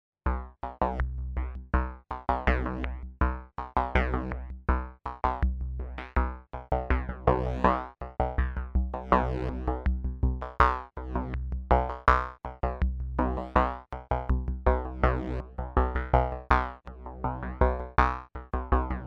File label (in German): Moog Labyrinth Sequence unbearbeitet